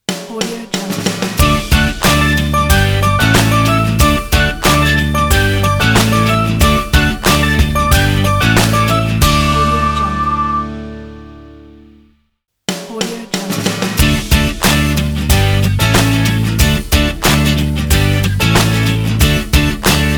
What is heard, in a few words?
happy melody logo; puremusic